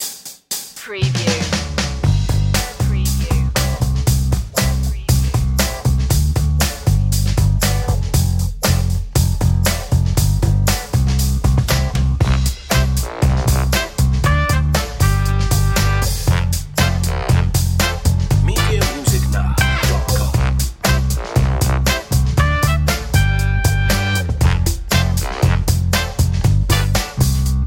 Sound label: fresh groove